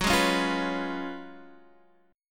Gb Major 7th Suspended 2nd Suspended 4th, down, Standard; strummed; JGuitar